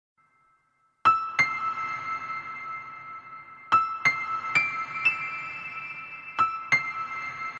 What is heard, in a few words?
Simple Tone